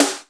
smooth steel snare drum sound C sharp key

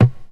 mid tom drum sound b key

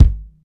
sharp kick single shot g sharp key

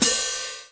loud open hat sample a sharp key

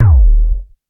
00s large room bass drum d sharp key; kick drum

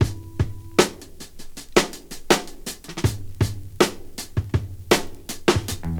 80 bpm drum loop g sharp key; drum loops

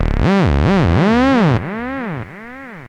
monodelay, synth; synth loops

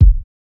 boomy kick drum F# key

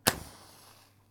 single match lighting, burning and blowing out

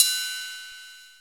big crash cymbal single hit g sharp key

